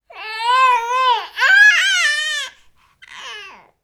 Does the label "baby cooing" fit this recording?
Yes